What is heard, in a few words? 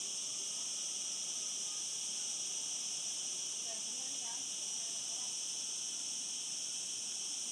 summer sounds